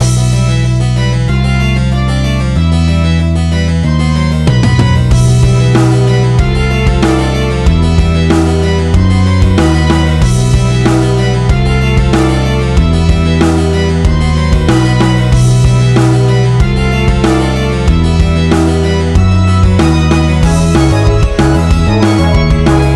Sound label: bossbattle